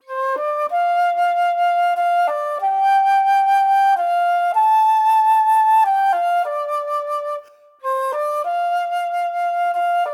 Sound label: CELTIC FLUTE